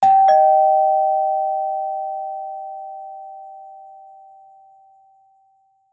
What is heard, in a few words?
DOORBELL